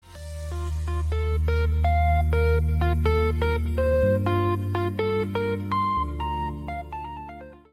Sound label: sonic logo modern tech; songprintz